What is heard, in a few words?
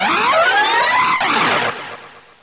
SPIN, HELICOP